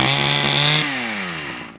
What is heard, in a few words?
saw cut, grinder; PC Gamer IT CD 45; SMANDEMO; baddys